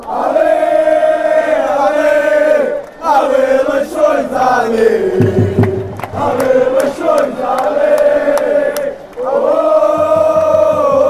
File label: allez allez allez, fan chants; leixoes